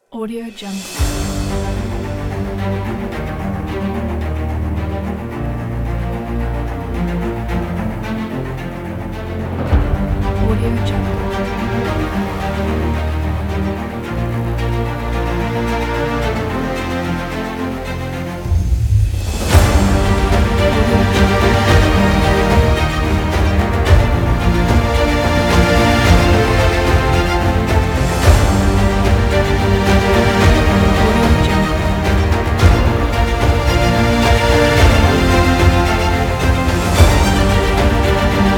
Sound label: trailer epic